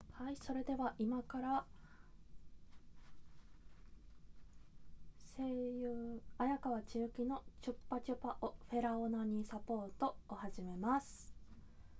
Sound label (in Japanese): ノイズ部分カット ちゅっぱちゅぱ フェラ + オナニー 実演 カウントダウン ステレオ; オマケ 鈴の音などをカットした音声